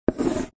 office handwriting pencil draw line on cardboard box